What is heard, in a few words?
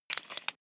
metal rattle lock